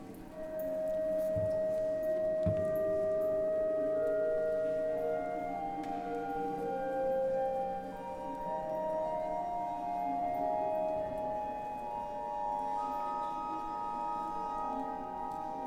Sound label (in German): Orgelvorspiel; Straßburg St. Thomas